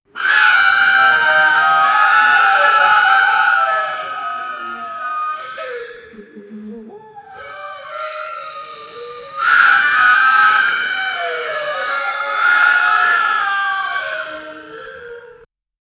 a crowd of toons scared

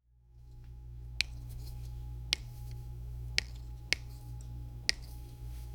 soft slicing, food preparation

